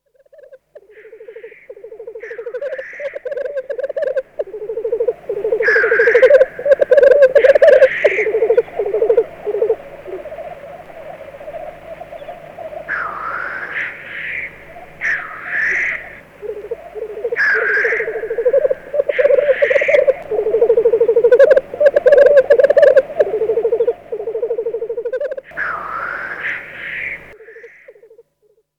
cietrzew, ptaki; Przyroda ptaki i zwierzęta

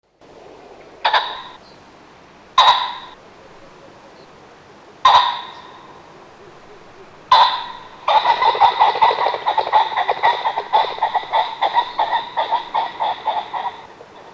bażant, ptaki; Przyroda ptaki i zwierzęta

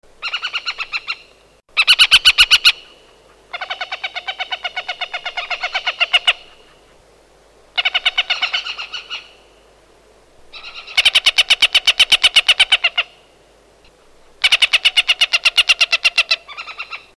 blotniak zbożowy; Przyroda ptaki i zwierzęta

